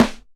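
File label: Snare Drum Acoustic Chorus Hi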